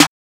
Snare Deep; Snares

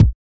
Kicks

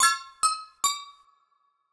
Loop

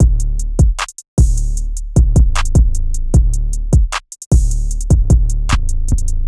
Drum Pattern 2 (153 BPM)

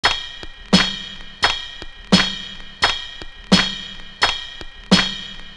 Loop

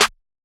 Clap

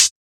Closed Hats

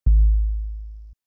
Bass Tom

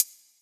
Hi-Hat, Closed Hats

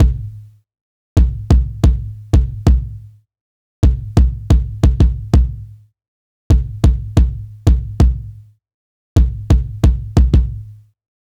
Jazz 90bpm Kick

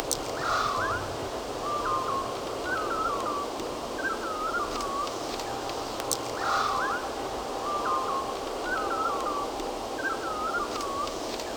NASA space ambience + backyard birds singing; Bored Lord

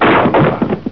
Crates

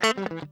Stabz

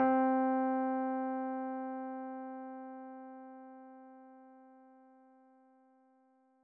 Organ

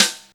snare, kits

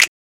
Snap

